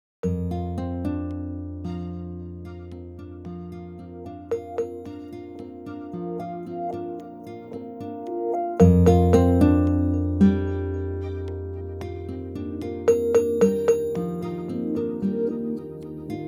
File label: Thump, alarms; Vivo; X27 Pro